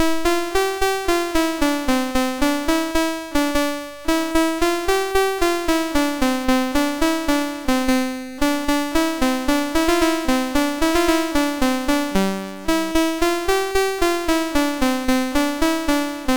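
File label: Petch, MOS 6581 SID chip music, games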